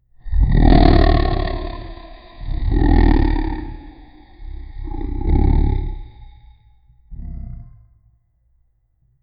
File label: dragon roars echoing